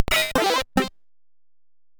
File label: Jingles, SIDTunes